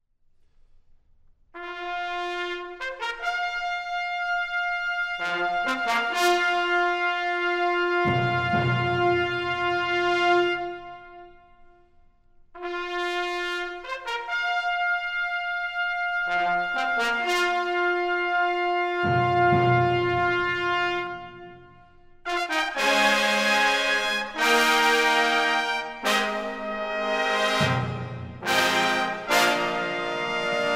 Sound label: Heroic Fanfare